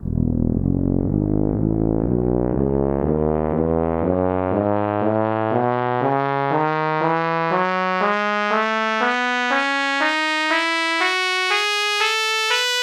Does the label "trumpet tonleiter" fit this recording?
Yes